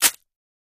Plastic Crush

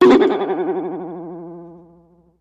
Boing Box Vibrato

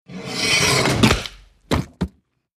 Guillotine Dry; gore stabs & eat

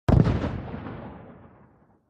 1 Pound Pvc Pipe Bomb

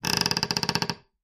cabinet door screech; cabinets

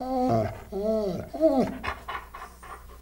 Dog Wanting Moan

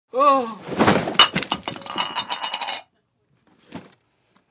Male Faints Collapse

